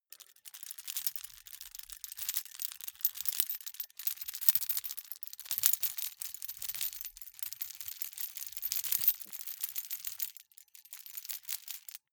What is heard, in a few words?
key ring large rattle air sequence soft; objekte; schluessel